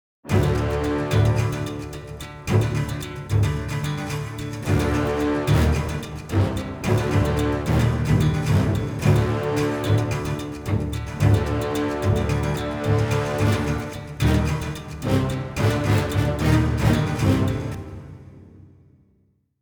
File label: Monster Hunting Combat; Skellige